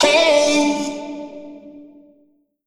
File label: Vocal one shot D; Slate Digital Omnivox Vocal Sample Pack